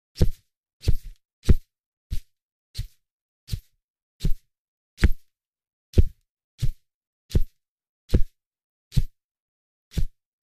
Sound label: Boxing Shadow Boxin